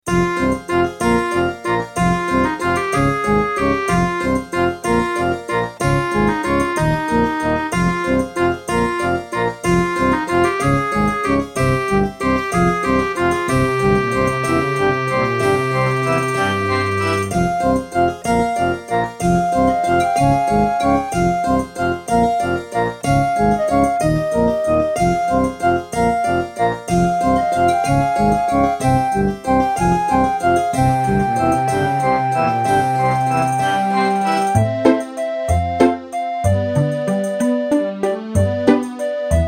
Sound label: Track 6, Creative Wave Blaster; Death Gate